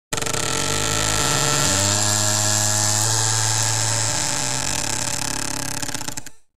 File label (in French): grincement de porte